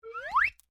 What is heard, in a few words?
Whistle Slide Up, Simple slide whistle